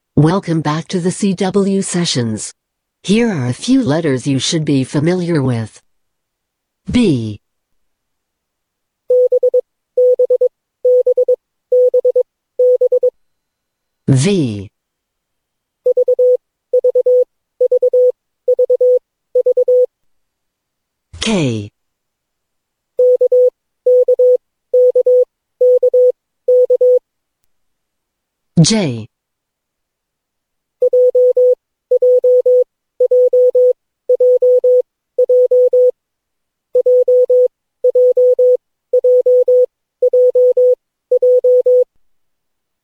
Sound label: cw training